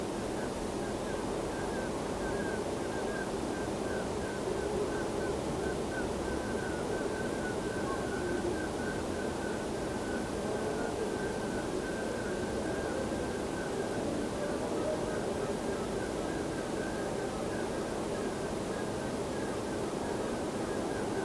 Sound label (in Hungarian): fekete rece hangja